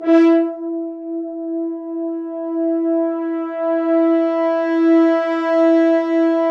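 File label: French Horns sfz; Roland LCDP06 Brass Sections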